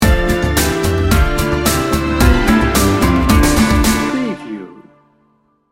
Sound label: world traveler sting bumper